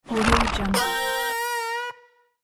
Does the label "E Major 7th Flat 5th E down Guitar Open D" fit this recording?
No